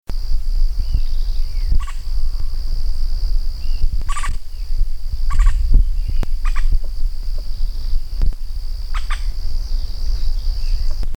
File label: guayaquil woodpecker